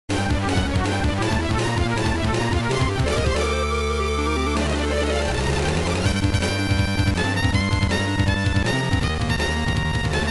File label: Ultra Battle; Magic Tower Night Crafting Story